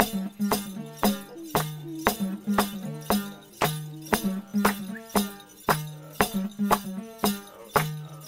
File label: 116 BPM; Global Influences Loops